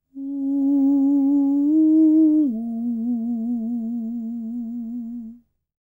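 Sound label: falsetto, Vocal Planet Jazz FX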